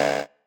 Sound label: marimba bike; ゆめ2っき